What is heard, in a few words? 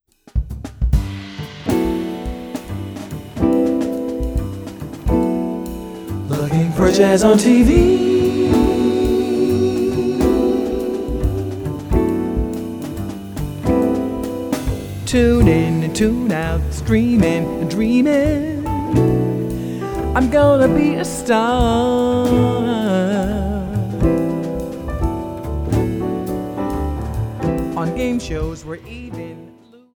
Jazz